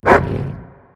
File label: bark; smite; scylla